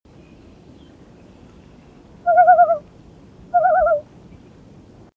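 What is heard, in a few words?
common loon voice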